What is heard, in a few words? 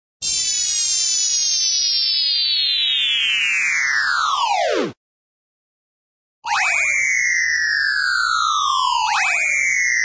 electronic moog slide down; cartoon